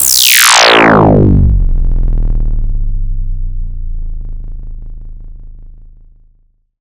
SYNTH FX

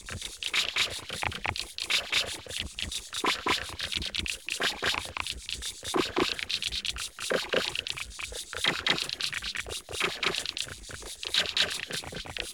UNDERWAT; AMG; Global Trance Mission VOL-2; PROCESEDLPS

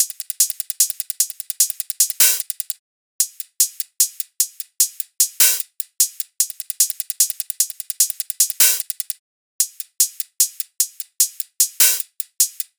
loop hat 150; Seismic Loops

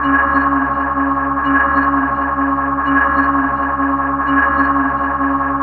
Dark org, Pads; Sonic Foundry (Sony Creative Software); Synchro Funk

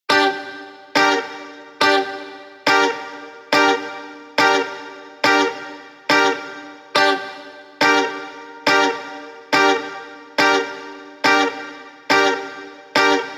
140BPM Gmin Guitar FX, Offbeat Dub Guitars; Antidote Advent

